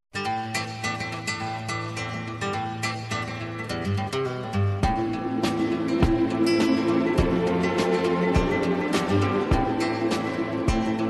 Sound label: Country Train